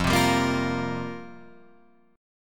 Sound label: F Suspended 2nd Suspended 4th Guitar Open F, Fsus2sus4 chord; strummed; 5,7,7,5,8,5; JGuitar